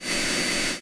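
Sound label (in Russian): шуршание ленточной пилы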